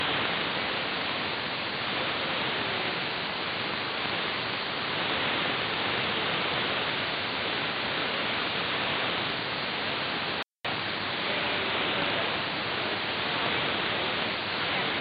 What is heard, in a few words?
Radio Marti AM